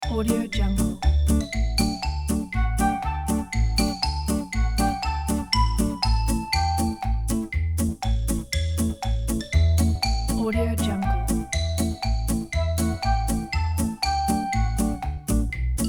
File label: child game